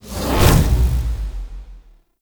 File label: Magnetic Field Cast; Warframe; Focus; Unairu